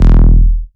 Bass Shot E; Mutekki Media; Vengeance Electroshock Vol.2; Bass Shots

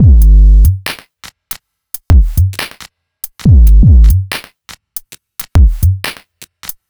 Bpm139 Deep Break, dubstep drum loops

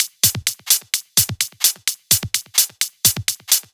Loop, 128 BPM

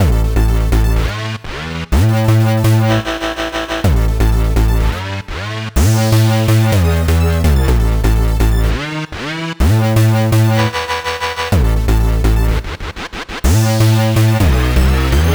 125 Am Full Mix, Complex Electro Synths; Ratio; Prime Loops

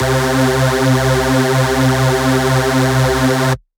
Dirty Dutch Lead C3; Vengeance Electroshock Vol.2; VES2 Dirty Dutch Special; VES2 Dirty Dutch Leads

